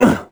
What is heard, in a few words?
dig; Crypt of the NecroDancer